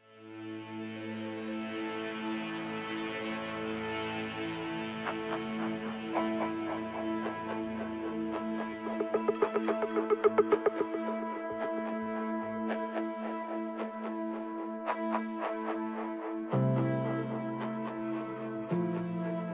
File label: Oppressive Situation suspense; reportage